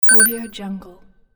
electronic computer signal

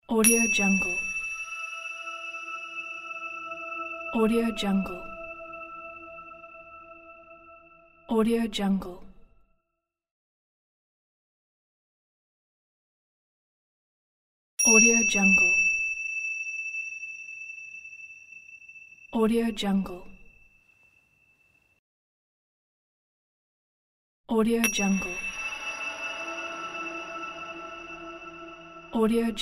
heavy bell impact

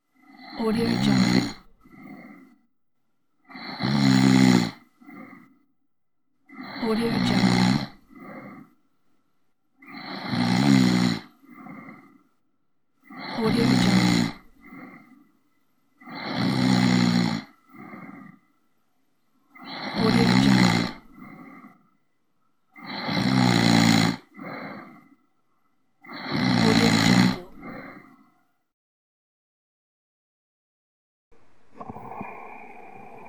snoring

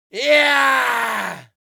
human vocal male victory evil

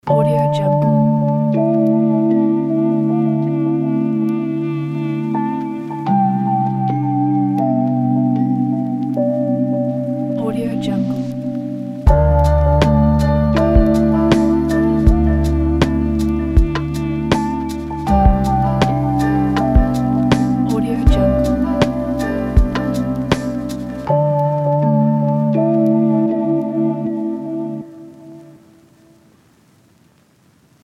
lofi logo

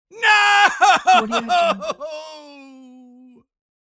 male scream no

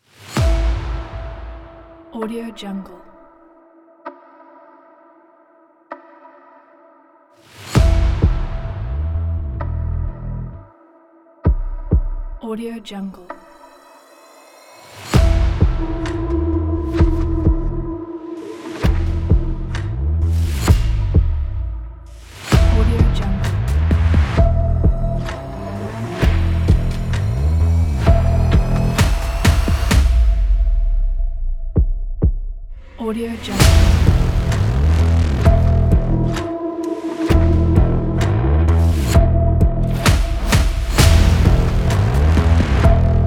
hybrid trailer; edrecords

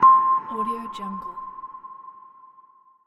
soft ding

